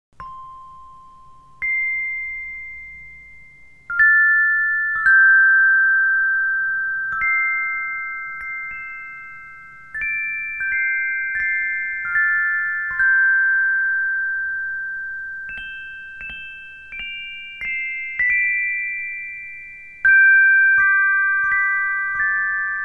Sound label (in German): Xylophon